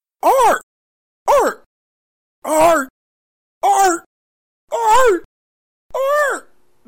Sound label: arrrrt